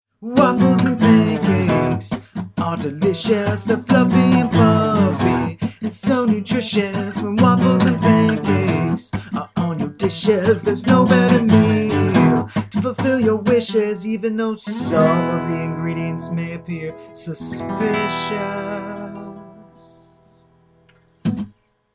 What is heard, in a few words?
jingle; waffles n pancakes